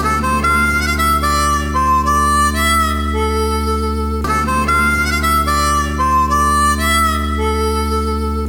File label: RINGTONE